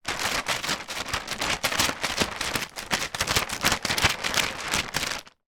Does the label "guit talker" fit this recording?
No